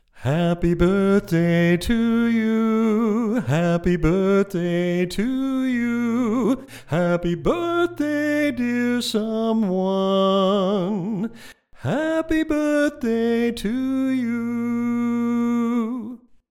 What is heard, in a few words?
Happy Birthday Lead